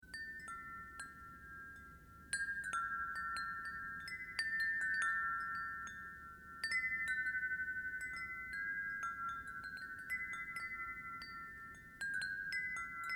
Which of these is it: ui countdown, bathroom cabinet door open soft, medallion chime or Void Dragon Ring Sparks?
medallion chime